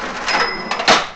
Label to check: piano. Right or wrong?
Wrong